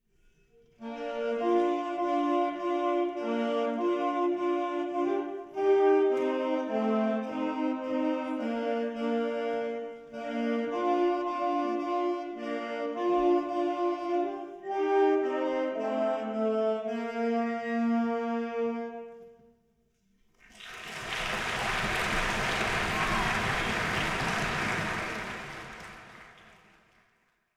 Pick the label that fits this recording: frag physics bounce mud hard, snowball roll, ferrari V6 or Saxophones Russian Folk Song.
Saxophones Russian Folk Song